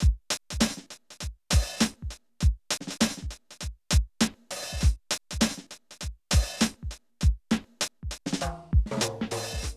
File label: Drum loops, Hip-hop